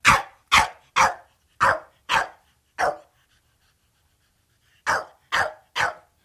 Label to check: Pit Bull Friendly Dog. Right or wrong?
Right